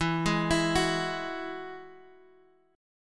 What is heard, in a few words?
E Major Add 9th E, Guitar, Standard; arpeggiated